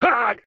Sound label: military hit; STALZONE; customnpcs; stalker